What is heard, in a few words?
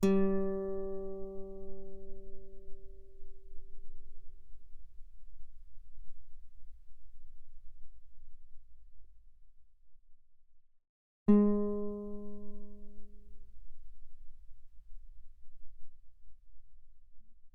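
Guitar mezzo forte sul G G3 B3; mono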